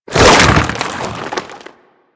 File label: Arm Attack; Warframe; Infested; Ancient; Attacks